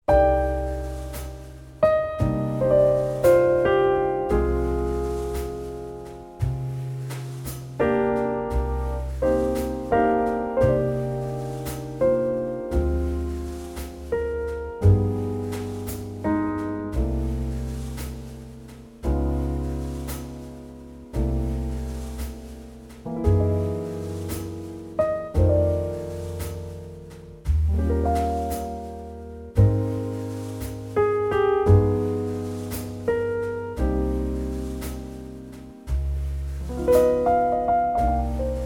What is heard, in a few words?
C trio